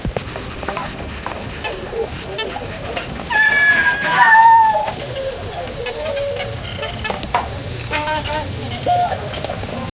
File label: cheerful chick claps tiny; market chatter